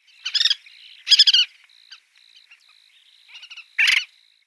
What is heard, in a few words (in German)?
Schwarzfluegel-Brachschwalbe Glareola nordmanni; Vogelstimmen